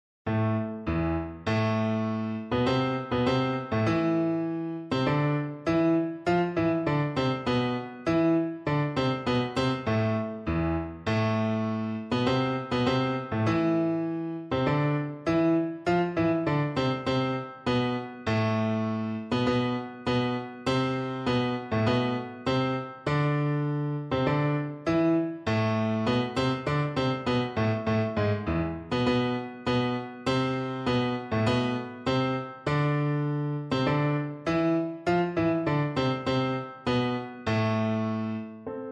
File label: L Arlesienne Prelude PNO, piano